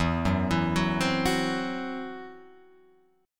E Suspended 2nd Sharp 5th E, Guitar, Open F, Esus2#5 chord; arpeggiated; 4,1,4,1,3,1; JGuitar